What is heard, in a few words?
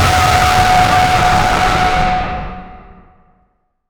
jumpscare sound